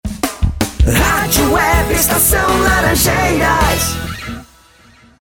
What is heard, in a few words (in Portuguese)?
vinheta